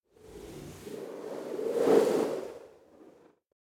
Wind Gust Fast Cold - Short And Thin Blizzard Whoosh; Hollywood Edge; sound designer's toolkit v2